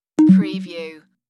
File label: Bleep